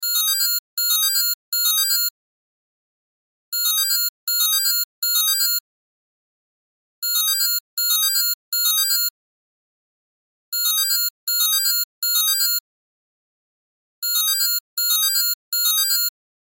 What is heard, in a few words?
Cell Ringtone; Loop; Cell Phone; Cell Phones (Sound Effects:Electronics)